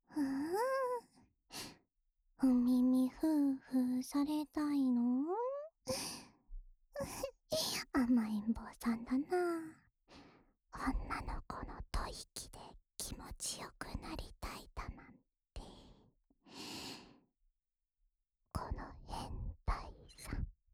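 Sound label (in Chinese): 安眠用耳朵呼呼（右耳）; 莉莉亞; 恋愛サポーター・青春べろちゅー委員会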